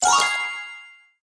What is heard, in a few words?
reward; GunfightShoot